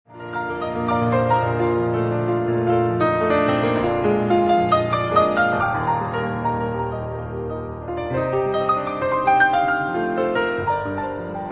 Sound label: piano